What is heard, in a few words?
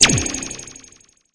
hit obstacle; The Powerpuff Girls - Trail Blazer; NextlevelGames